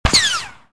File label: reflect, blaster; weapons